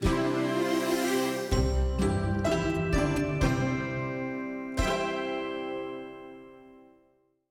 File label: Jingle Dance Payoff; My Little Pony World; android